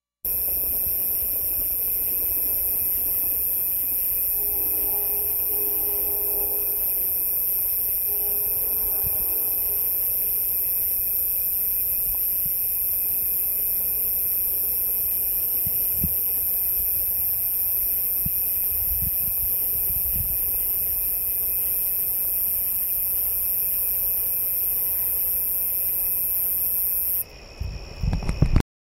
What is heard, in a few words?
neoconocephalus palustrus